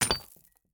mine stone

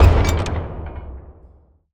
warship attack cannon; ships siege

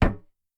sling metal, attack impact

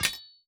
mine metal

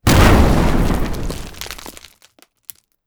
siege projectile hit, attack impact

